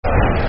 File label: South London Lalala, Football Chants; Crystal Palace Cpfc